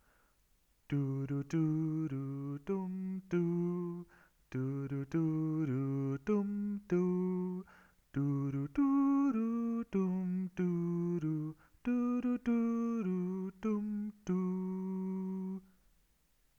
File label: monophonic recording